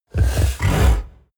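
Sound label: coloss snort deep short, creatures; kreaturen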